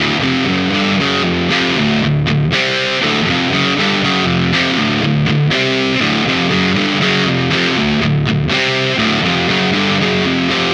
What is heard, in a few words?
EQ Low End Boost; Source Audio EQ 2